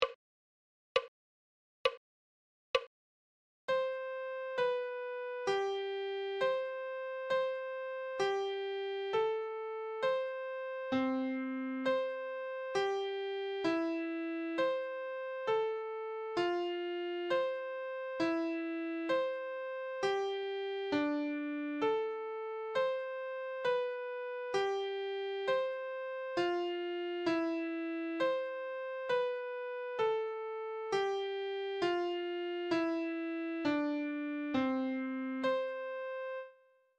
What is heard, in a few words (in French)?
clé de sol adagio